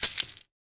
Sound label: Popping bloody Sac; SNKRX